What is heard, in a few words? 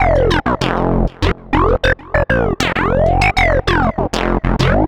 Synth Bass